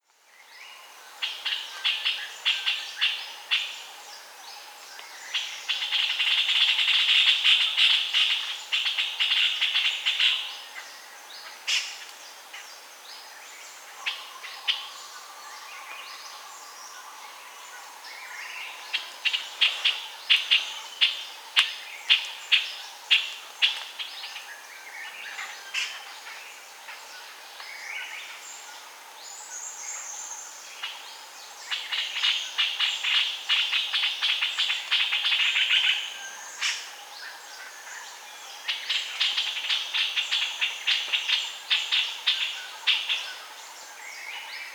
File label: Puff throated bulbul